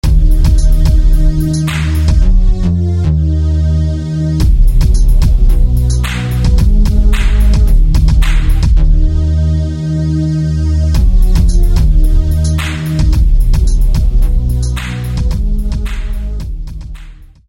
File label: Bass; Andromeda; SFB FX